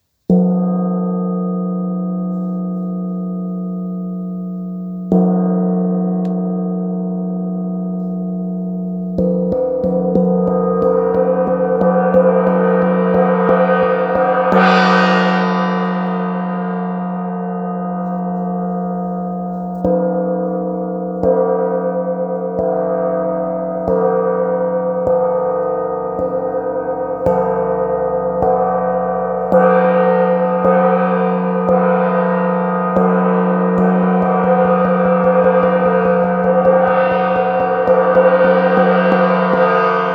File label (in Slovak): kvet, veterny feng gong kvet zivota 30 cm